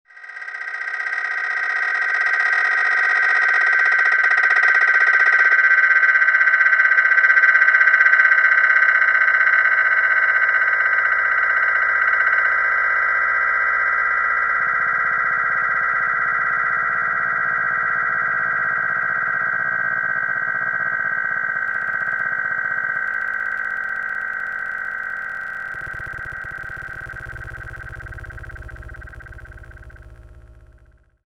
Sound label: drone, gamelan